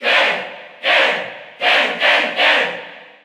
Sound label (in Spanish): Cheer Spanish; Ken; SSBU